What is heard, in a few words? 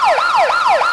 ambulance siren